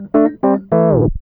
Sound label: E.PIANO, KEYS